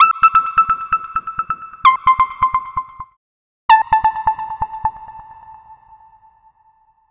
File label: Arpeggio Line, Synths; Sonic Foundry (Sony Creative Software); Modular Electronica